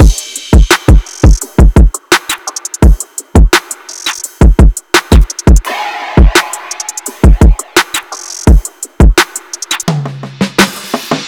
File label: Drum Loop - 170 BPM; Anthem; Cymatics